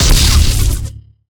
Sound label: Chain Lightning Fire Burst; Evolve; Elder Kraken